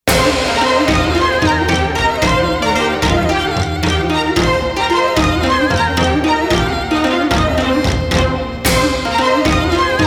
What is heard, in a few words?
chinese red dragon